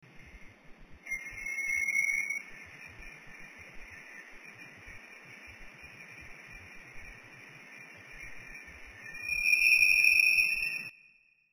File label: Signal Audio